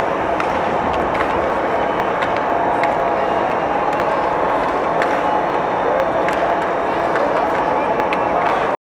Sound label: Artifical Crowd Noise; Pru Center